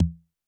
Tom, Yamaha DTXpress II

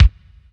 BD, Dance Mega Drums, HIP

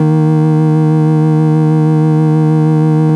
FARF, FARFISA

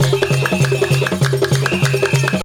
KANKURAN DANCE; Best Service; World Colours

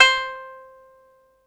banjo, BANJO+FIDDLE; Best Service ProSamples vol.55 - Retro Sampler; AKAI

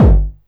BD, Drum & Bass, 160 BPM

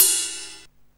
Ride, Alesis Hr16, Drums

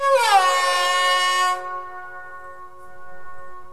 SARANGI TAB

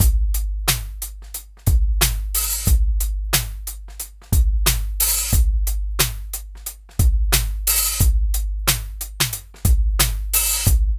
DRUMS; Funky Ass Loops; East West

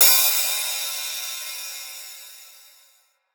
Ride; neuro; InsideInfo; Drums

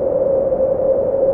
ARP2600, modular syn; Sonic Reality; Vintage Timetraveler

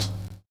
OLD LP, Kitch 70s; Univers Sons

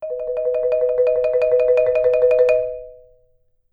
Marimba roll D#4; Sampleheads - Dave Samuels Marimba & Vibes

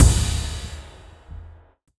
Percussive Stab

